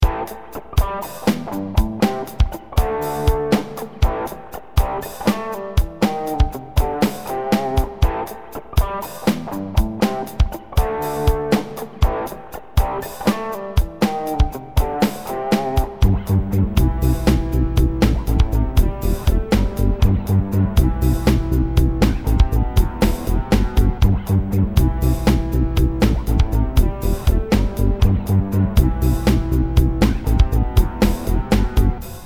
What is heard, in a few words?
rock